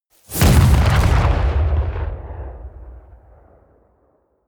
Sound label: detonation